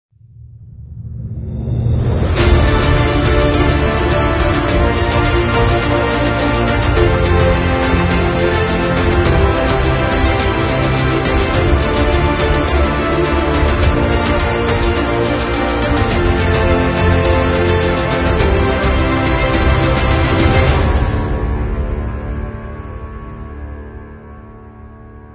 Epical

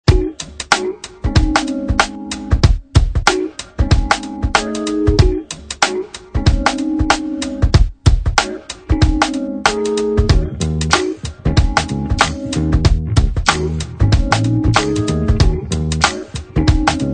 Funky Lounge Hip Hop

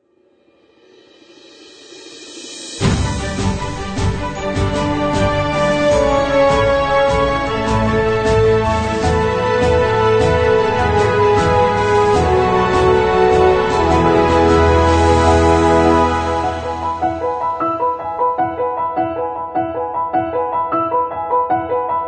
uplifting trailer

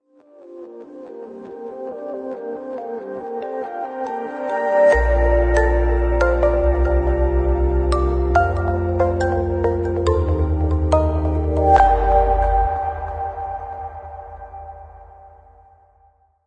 Floating Particles Logo ambient version

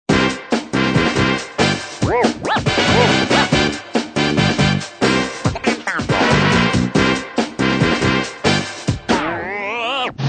Birthday Party Main Theme

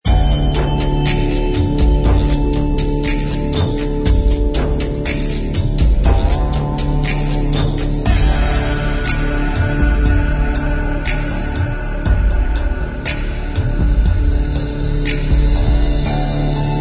Mystical Orient Endpart

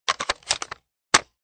Gun Foley Assault Rifle

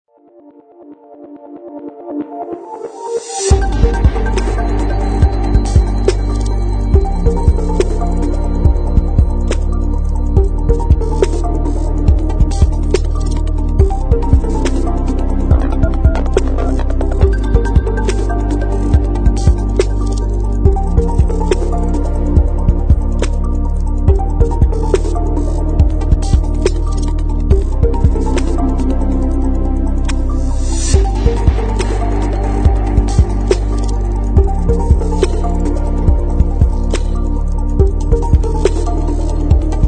Corporate Technology Digital

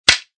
Arnis Sticks Hit

